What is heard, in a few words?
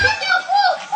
knife kill; flash new knife